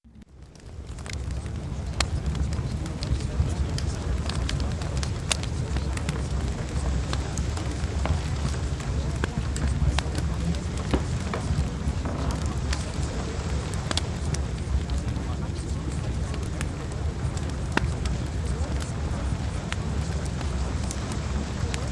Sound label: Fireplace